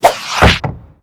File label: Character Attack; SFX